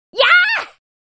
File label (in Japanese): 「やぁー！」; スキル(トライアングルショット)